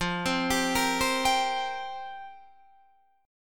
F Suspended 2nd Suspended 4th F down Guitar Open D